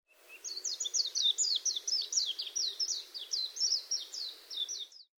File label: Mejiro